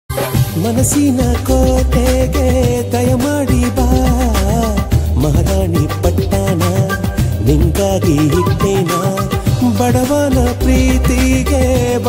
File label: song ringtone